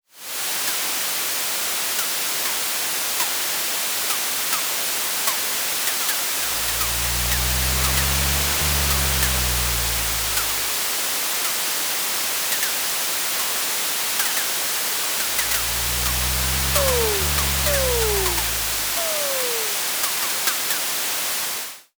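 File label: ambience rain window light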